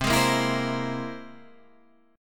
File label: C Augmented 7th C down; strummed; JGuitar